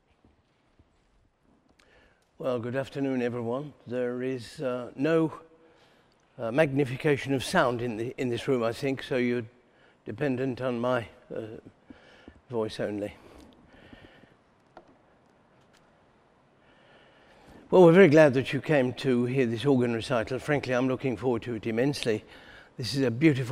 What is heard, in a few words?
organ recital